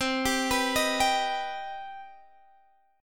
C Minor Major 7th C Guitar Standard; CmM7; arpeggiated; capo=2

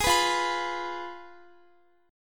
F Diminished Guitar; strummed

